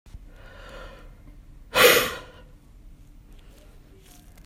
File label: Huff no audible secretions